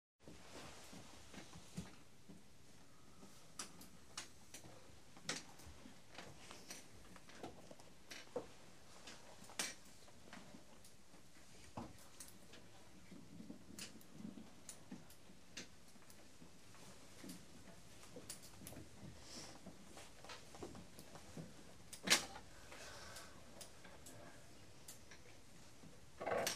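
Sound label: children silently rustling stationery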